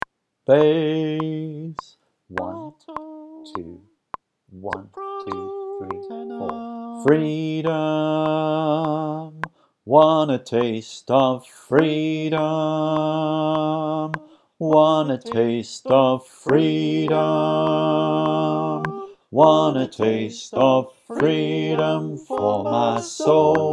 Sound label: Bass w click